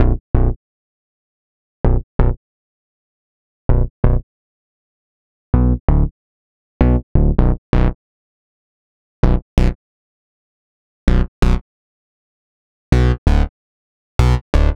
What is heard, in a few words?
Bass, EDM; Sonic Foundry (Sony Creative Software); Afterhours EDM